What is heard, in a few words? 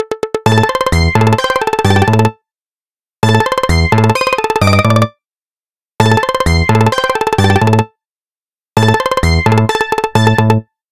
Funky Tempo